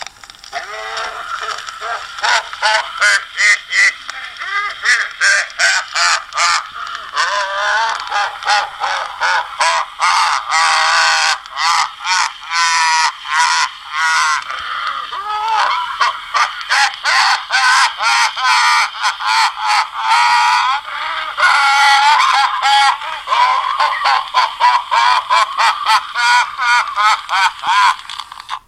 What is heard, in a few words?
Creepy Doll Laugh